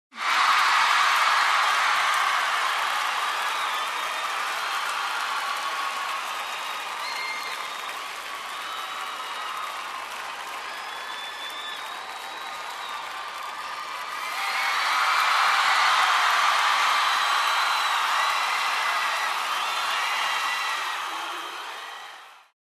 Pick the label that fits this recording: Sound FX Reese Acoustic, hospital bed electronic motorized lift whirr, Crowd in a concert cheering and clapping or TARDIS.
Crowd in a concert cheering and clapping